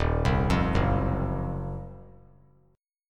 Eb Minor 6th Add 9th Eb down 3C,3F,3A,3D; arpeggiated

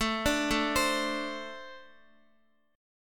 A Minor Double Flat 5th A, Guitar; arpeggiated; JGuitar

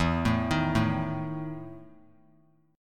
E Major 7th Suspended 4th; arpeggiated; JGuitar

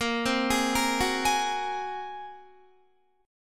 Bb 7th Suspended 2nd Sharp 5th Bb, Guitar; Bb7sus2#5; arpeggiated; JGuitar

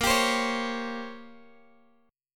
Bb Minor 6th Add 9th, Guitar, Open G

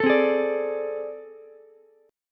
A Minor Major 11th, down, Ukulele; strummed; AmM11; JGuitar

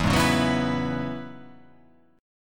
E 6th Add 9th E down

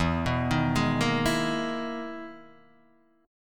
E-Major Sharp 11th E, Guitar DADGAD

